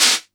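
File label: Cymbals open hi-hat; Vengeance Samples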